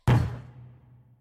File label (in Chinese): 未命中; 战中; nba